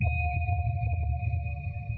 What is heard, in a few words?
sonar Ping Suit Medium Directional; Barotrauma; Real Sonar